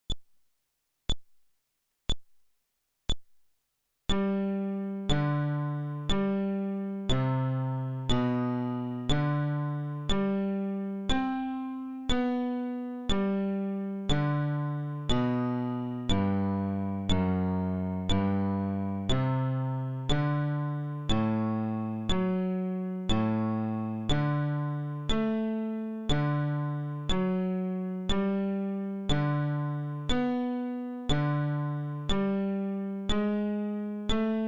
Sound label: Arpeggio, fretless bass